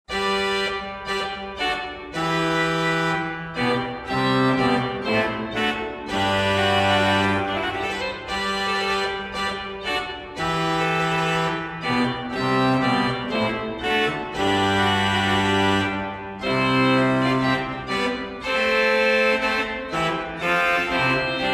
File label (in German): trombetten